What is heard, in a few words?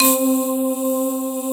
SYN K-2000, Roland; Sound & Vision - Gigapack I CD 2